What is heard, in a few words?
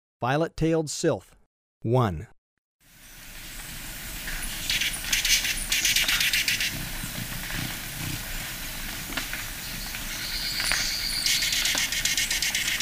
Violet-tailed Sylph; S. America